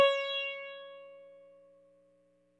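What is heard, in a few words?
electric sitar soft; Quantum Leap - Guitar & Bass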